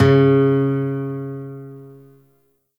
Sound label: Shawzin Oct One C Sharp; Warframe; Lotus Shawzin